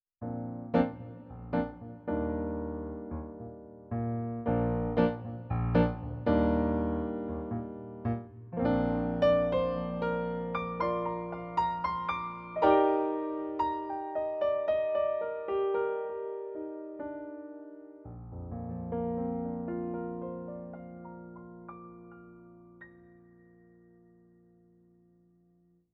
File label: CN34 Mellow Grand